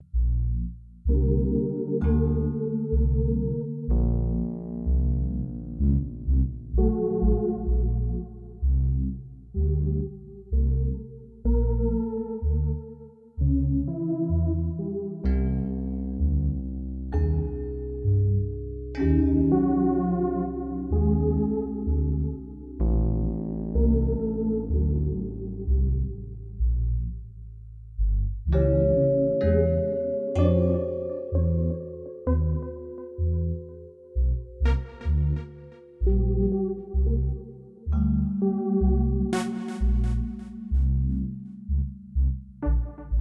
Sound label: Dystopia Sewer Slink; Costume Quest 2